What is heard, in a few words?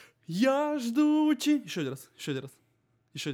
tenor